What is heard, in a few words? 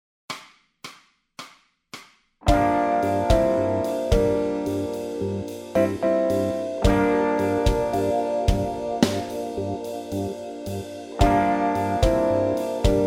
bass scales, backing tracks